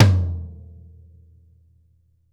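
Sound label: floor tom; drum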